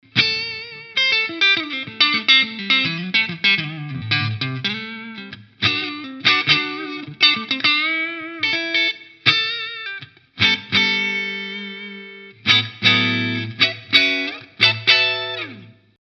blues screamer; MOD Devices MOD Duo

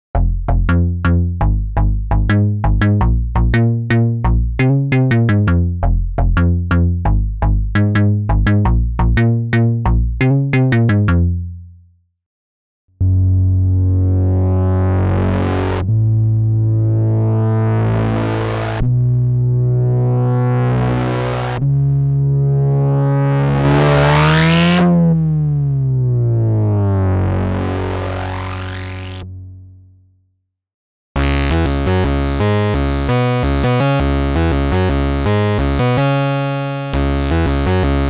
Moog Sub25 Bass

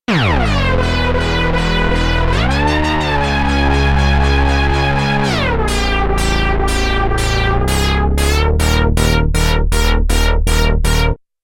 Wobble Bass; KOPRO+

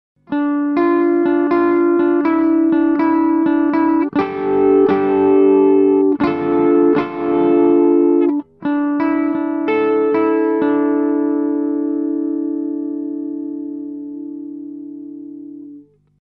Korg NuTekt TR-S Darker Setting Low Gain P-90

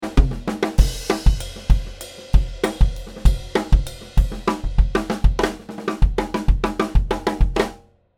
Drums

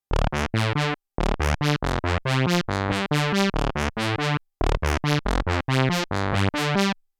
AMS dmx 80S Prophet dry

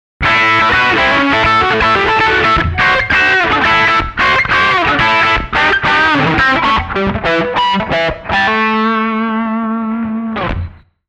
Lead

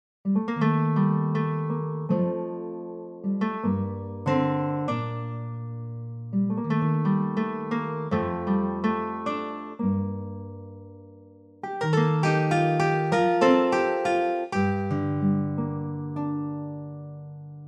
Guitar

